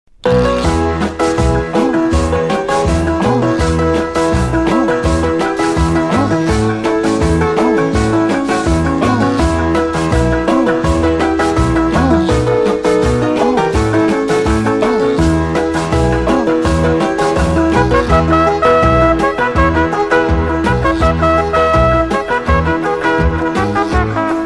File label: Instrumental